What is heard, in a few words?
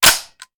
Machine Gun Foley; Sound Ideas; Guns